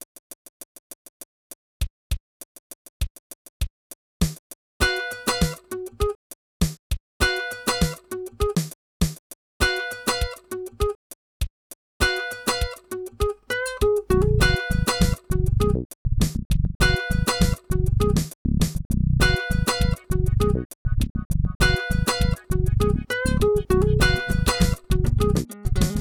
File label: Wax Funk